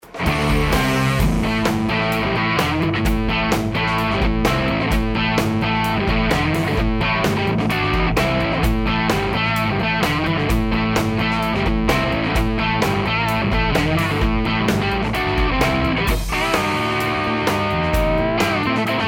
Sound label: higain neck, Tele; schwarzbach; Tele60s